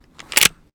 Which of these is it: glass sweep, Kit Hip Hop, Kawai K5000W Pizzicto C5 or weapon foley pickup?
weapon foley pickup